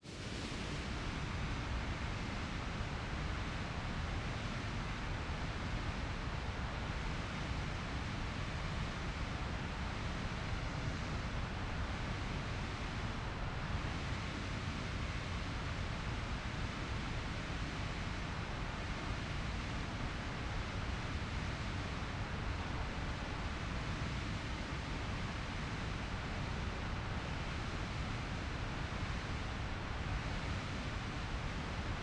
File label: granular synthesis, nature ambient; aulart